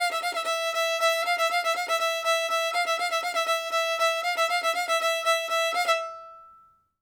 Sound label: violin